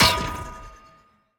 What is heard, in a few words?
goal miss bounce, Ball Collision Goal Frame